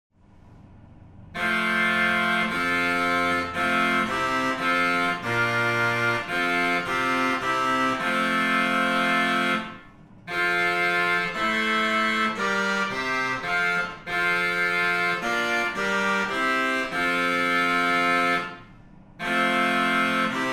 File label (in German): Orgelregal; Engelke Brink; Psalm 47